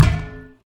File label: Hit Spade Plastic